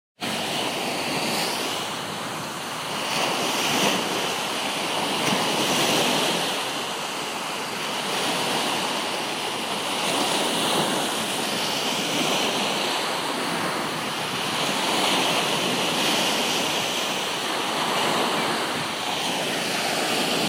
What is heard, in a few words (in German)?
Meeresrauschen